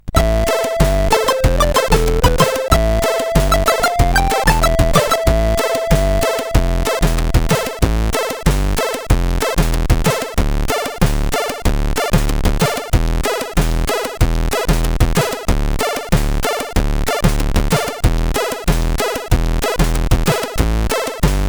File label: Tumti-Dum, CSG8580R5